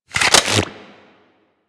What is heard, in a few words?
Dual Minigun Reload